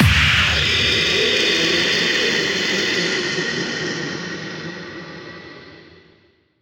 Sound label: Hallkick; Vengeance Samples; VEH1 FX